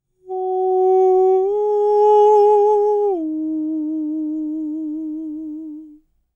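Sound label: falsetto, Vocal Planet Jazz FX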